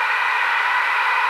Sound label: win super mega win loop; superstriker